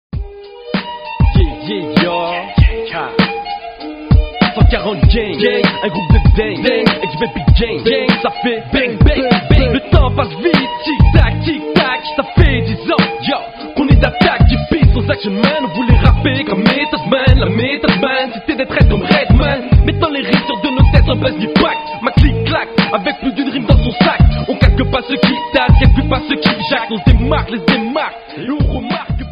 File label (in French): rap